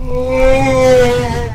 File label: scare; cartooncat; slender